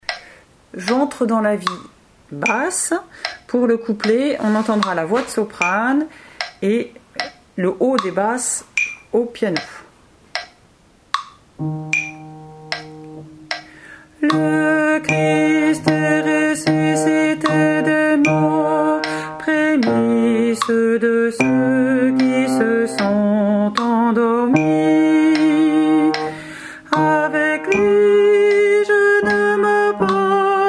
BASSE

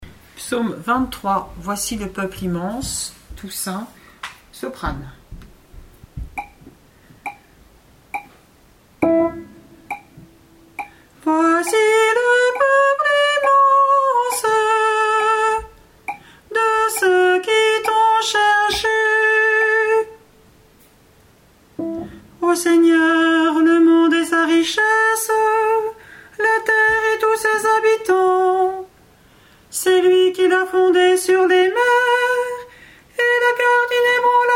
SOPRANE; PSAUME 23 TOUSSAINT Voici le peuple immense de ceux qui tont cherché

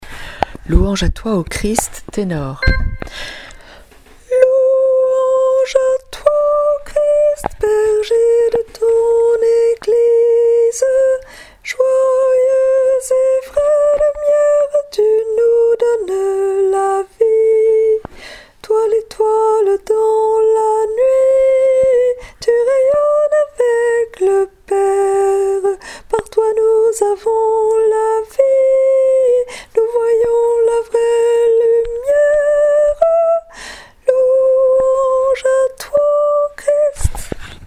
LOUANGE À TOI Ô CHRIST TENOR

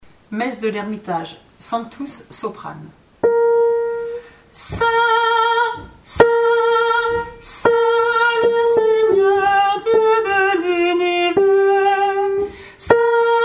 SOPRANE

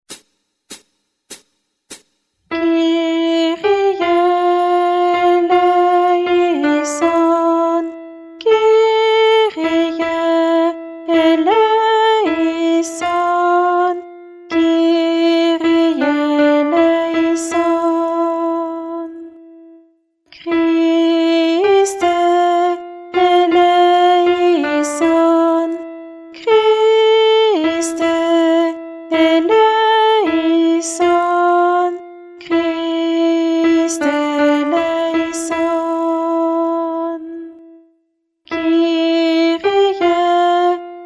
Messe de St Paul Kyrie Guide chant Alti

